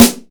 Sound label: sharp acoustic snare sound a sharp key; snare drum samples